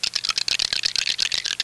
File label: dice shake